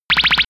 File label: bubble laser; krieg-waffen; soundpool